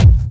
kick, Heartbeats

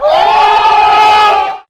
scream

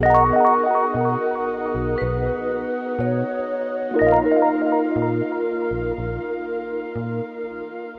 vibes, phonky loops

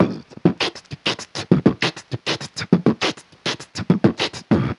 meatox, beatbox; Drum loops

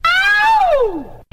ow scratch; Voice

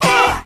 "uhn"

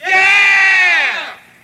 "yeah", scratch

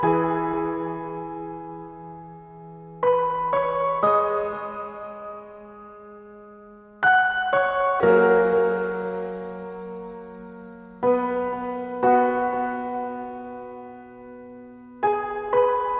piano kitchen sink, Loops Sad

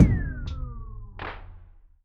power down, Vinyl; Misc Percussion

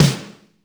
Snares, Real

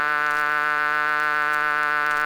public beep; Notes

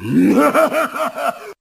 laugh demon

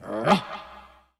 rah; ooh ahh

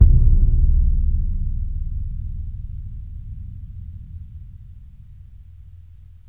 thud; Crash Bang Boom

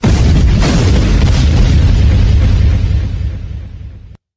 explosion; Crash Bang Boom

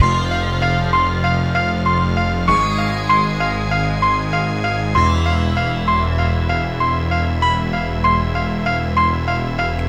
piano halloween, Loops Sad

